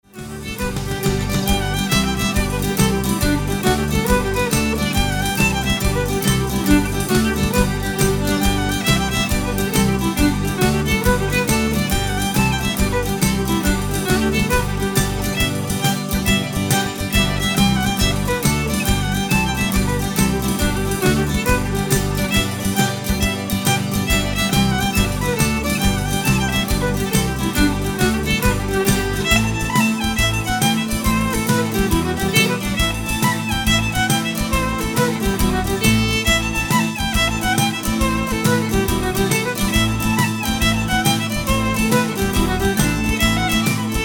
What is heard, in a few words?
Jigs